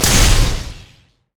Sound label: guardian spell attack; odin